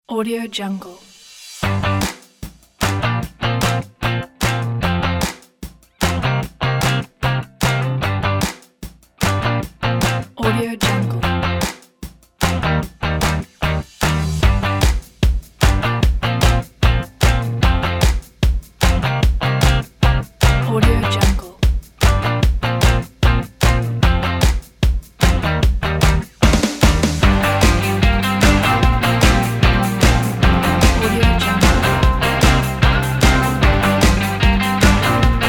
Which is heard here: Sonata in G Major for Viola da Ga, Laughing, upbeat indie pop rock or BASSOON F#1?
upbeat indie pop rock